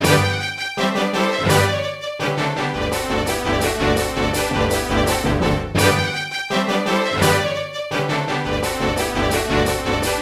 Figure Skating

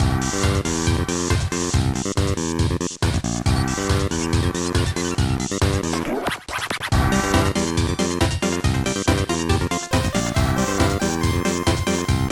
Music; NES Remix

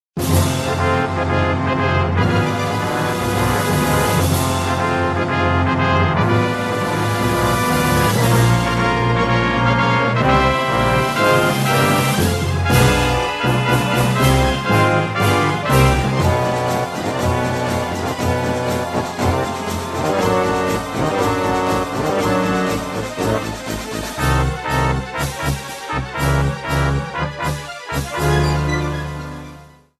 theme

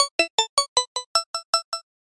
Mixed-Match Clear Game Jingle

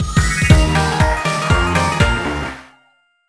Coaster Success

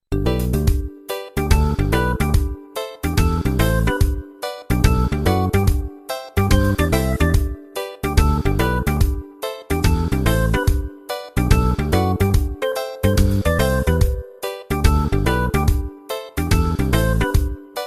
Mini-Game House Theme; MP

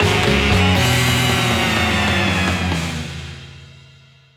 Impact

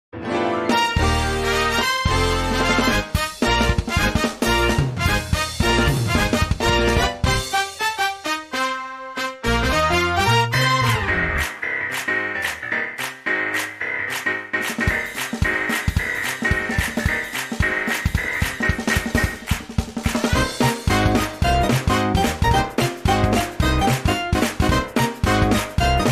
Toad's Factory's music

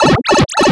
The sound heard when exiting a Warp Pipe; Pipe Out

